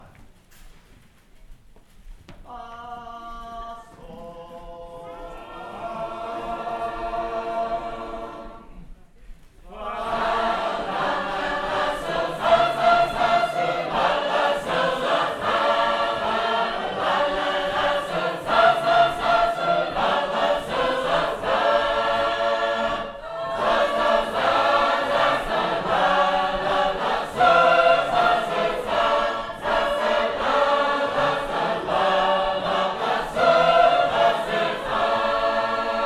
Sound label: Passing Away, sacred harp